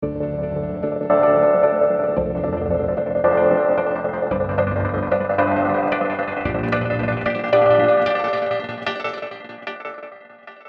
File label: Rock Chords WET